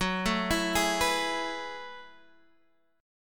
Gb Minor 11th Gb down Guitar Half Step Down; Gbm11

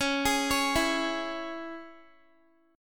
C sharp Minor down Guitar; C#m arpeggiated

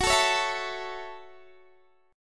Gb Minor 7th Gb, Guitar Open D

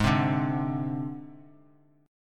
G sharp Major Flat 5th, Bass Standard; strummed; x,11,10,7

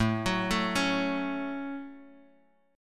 A 6th, Guitar, Drop D

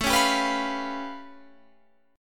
A Augmented 9th A, Guitar Standard; strummed; JGuitar

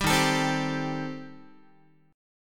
D sharp 6th Flat 5th, D sharp, down, Guitar; strummed; JGuitar

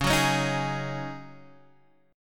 D sharp Minor 11th; x,4,4,6,4,4; chordshape

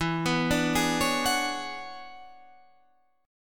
E 13th, Guitar, Standard; arpeggiated; JGuitar

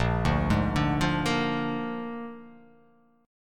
Bb 7th Bb down, chord; arpeggiated; JGuitar

